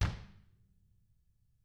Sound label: DW HARD, KICK